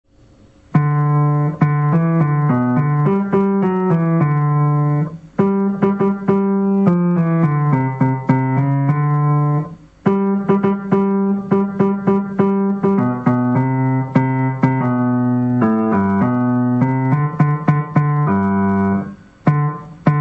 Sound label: Piano Bass; バス パート; 校歌の練習用音源; 西高校歌(ピアノ); 二中、夜中、西高校歌; 輔仁会